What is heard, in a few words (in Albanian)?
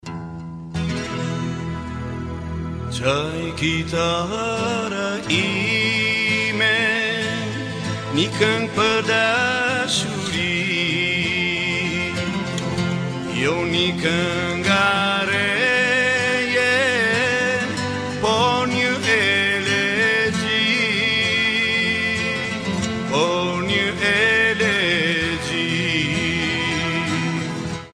Qaj kitara ime